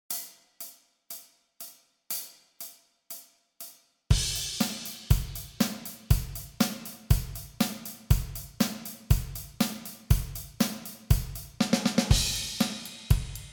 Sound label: 120 bpm, practice tracks